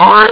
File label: oiyy; ST-XX Amiga Soundtracker Sample Packs